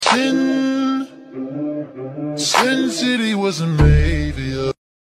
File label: rizz sound